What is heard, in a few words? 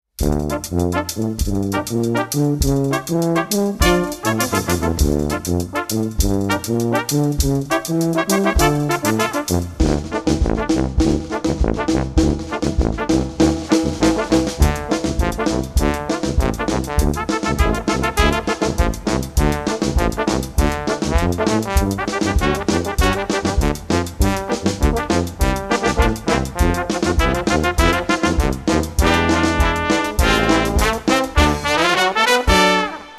brass band